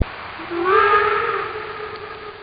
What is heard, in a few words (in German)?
Lokpfiff, modellbahn